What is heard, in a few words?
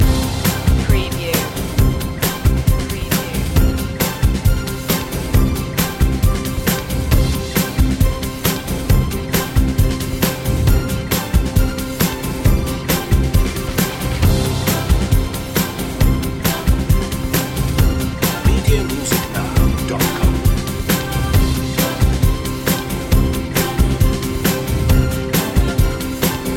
big celebration loop